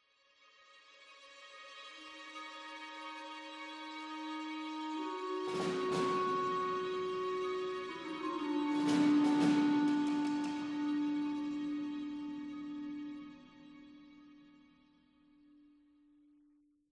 Ambient

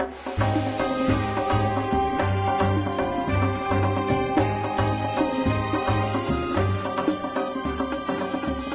Restaurant; Diner Dash Once Upon a Diner

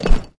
Stone Platform Turn; Cut the Rope: Time Travel

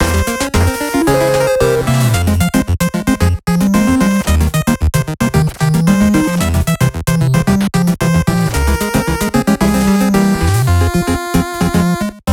Montuno; Cathedral

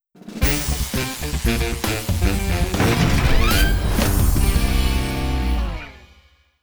Opening A Weapon Crate; blitz brigade; android

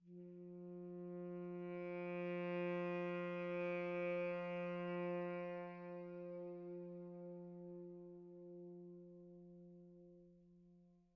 Env Sky High Altitude Bass; gamerip; The Legend of Zelda Tears of the Kingdom

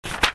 Message

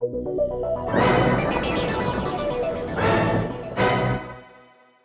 INTRO UI JINGLE

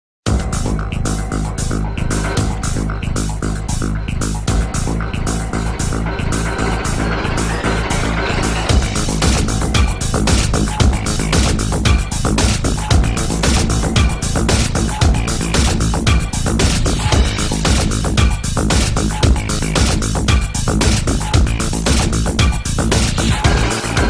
ufo; PSX

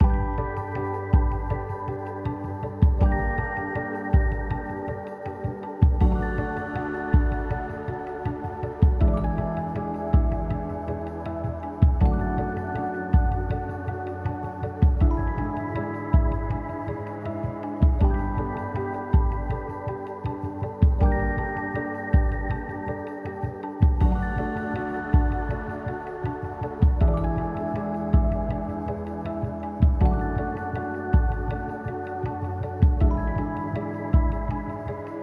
Forest Music, Fight Section

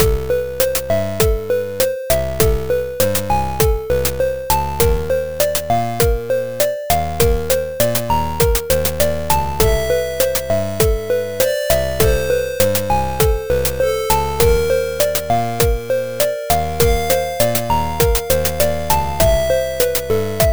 Slow; Adventure in the Tower of Flight

